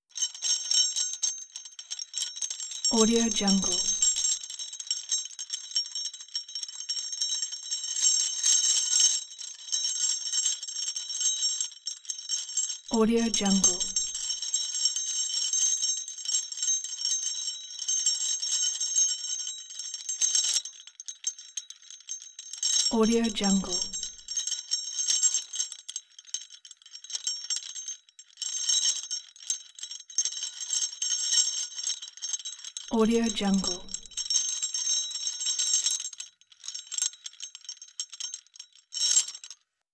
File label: pitched just chains rattling; soundbits-soundfx